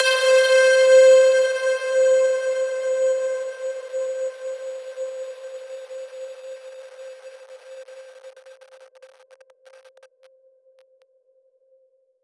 Biggest Bell Ever

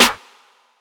Snare

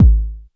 K909, KICKS TR 9+8

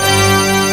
PIPE ORGAN G1; AKAI S6000 CD-ROM - Volume 1

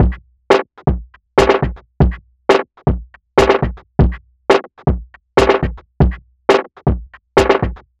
chabeat 120bpm, downbeat dance grooves

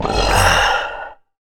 VOX VOX, Electro Vox

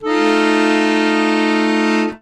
AKORDE F 7, ACCORD; ACCORD.CHRDS

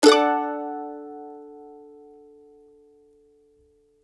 CEE CHORDS D, Blues Guitar Samples

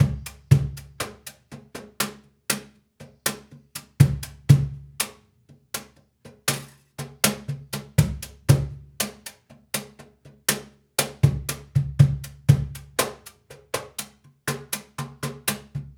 Cajun, Percussion, Drums; Essential Percussion

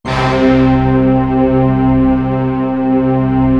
orchestra; East West; Global Sounds

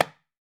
ROSEWOOD, African Drums

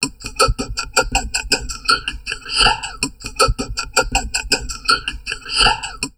TALK, Drum Loops Crazy Processed

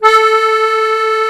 HOHNER, akkordeon

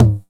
909 NOISY; Wizoo - Platinum 24 Electronic; 909 NOISY P1

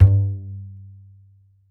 E, E string upright bass; Larry Seyer

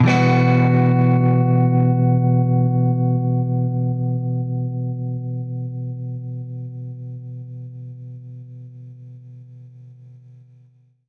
Baritone Chord Emin[add9], Guitar Chords; undercover samples

